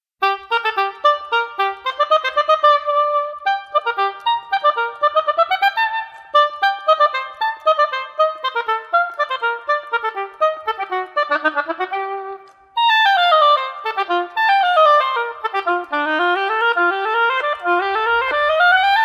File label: Oboe, g minor